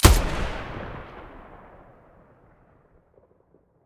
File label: mdr muzzle stereo, weapons